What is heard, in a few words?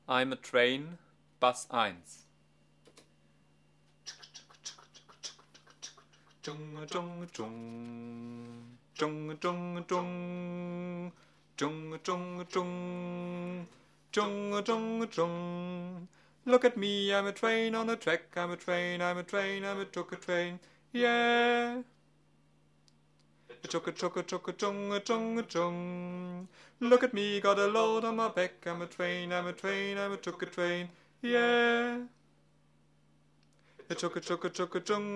Bass